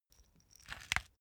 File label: small pepper grinder pressured click ring; industrie-wirtschaft; gastronomie